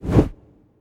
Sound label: gambler enter exit; Fruit Fiesta; Wazdan